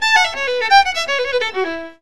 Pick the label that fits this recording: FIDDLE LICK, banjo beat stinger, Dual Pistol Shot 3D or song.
FIDDLE LICK